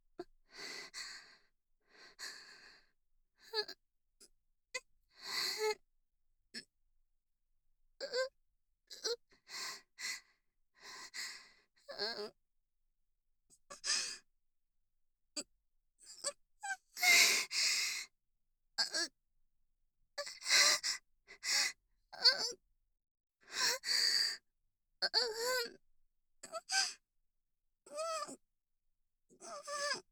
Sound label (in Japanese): 挿入 喘ぎ; SEなし